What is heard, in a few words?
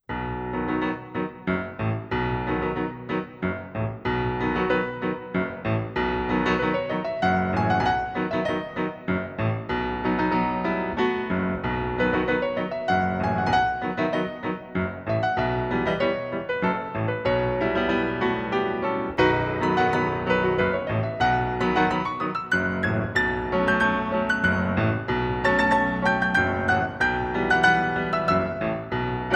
Grands battements Polonaise